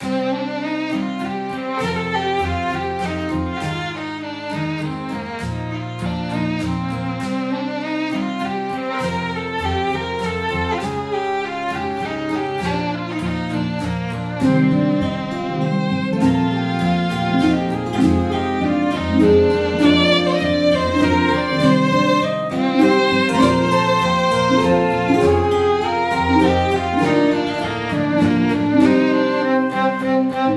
tavern loop; Kai Entity

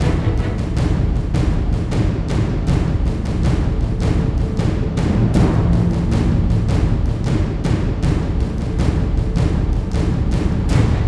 BGM ENEMY BATTLE; Titan Slayer II

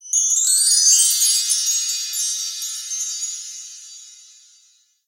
Cross Breed Chime

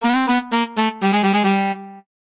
Lose; Yuru Yuru Gekijou Gekijouban 2 3

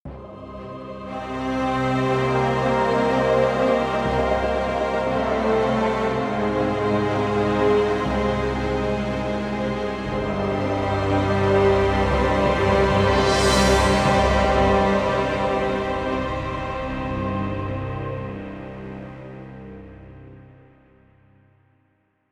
Game Loss

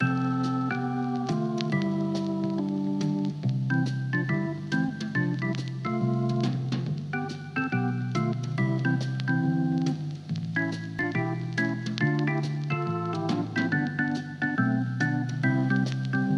motorcycles; lee woonderland

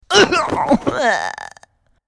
Porky Defeated

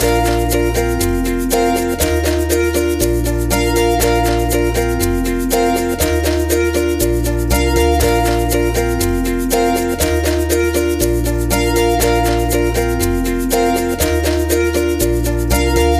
Grass Core

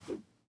throw; teardown; ps5 windows xbox series xs

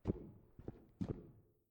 power out step

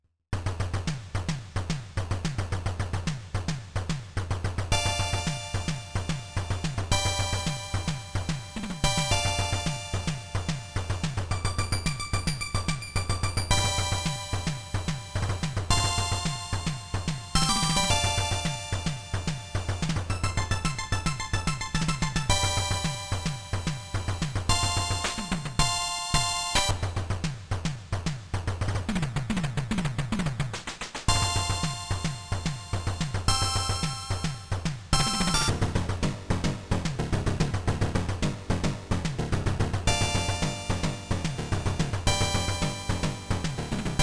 INORGANIC BEAT; PC Engine; World of Darius

